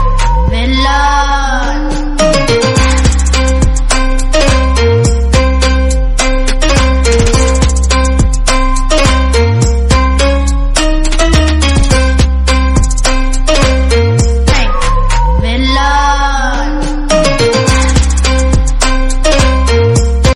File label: Theme Song; KGF Chapter; Villain